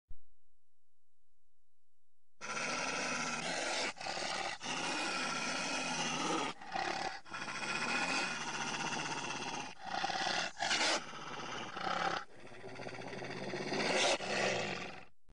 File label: wolf growling; animals